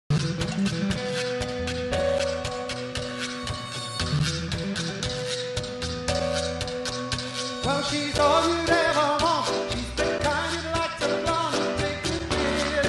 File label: Cha cha cha, orchestra